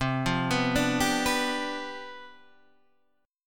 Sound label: C Major 7th Suspended 2nd Suspended 4th C down Guitar Standard, chord; CM7sus2sus4 arpeggiated; JGuitar